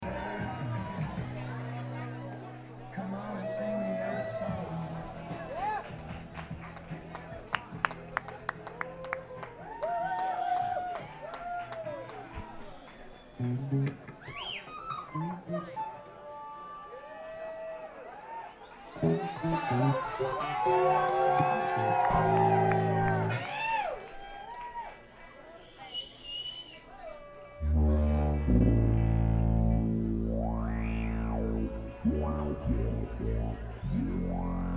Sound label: Tuning